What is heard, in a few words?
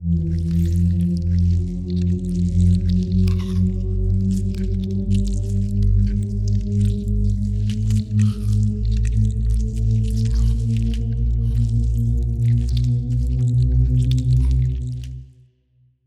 tilt open; biogenesis